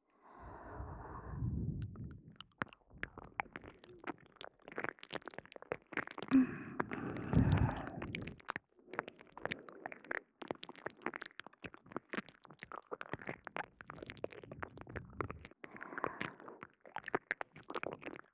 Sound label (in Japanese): 両耳舐め No Talking Ear licking ループ 仕様; 【耳舐めプレイ特化】耳犯2〜とろっとろ両耳舐めで絶頂快楽〜